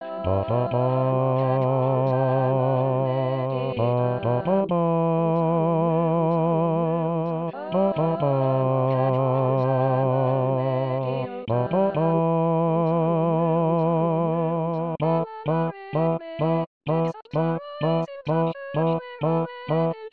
SATB bass

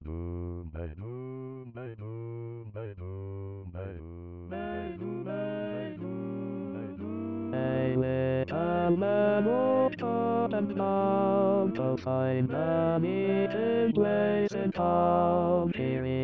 Lead All Parts 120bpm

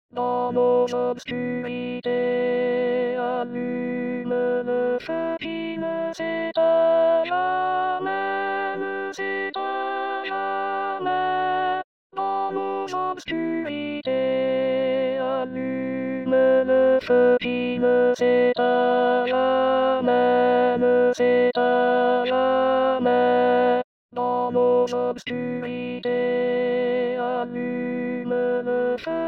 SATB alto

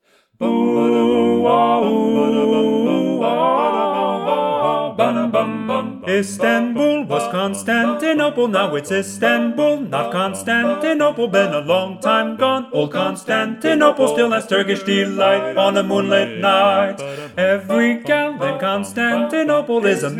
Lead predominant, ttbb

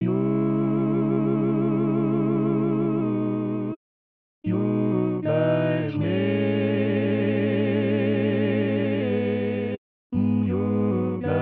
all in Cm

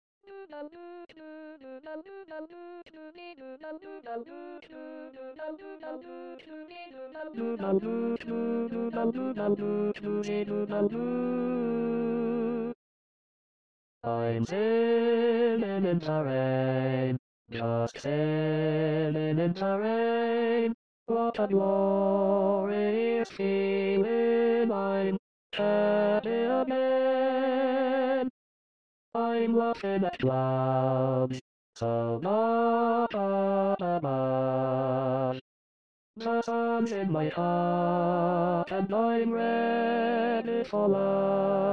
Tenor SATB Eb

